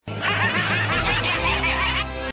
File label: laugh; Kickback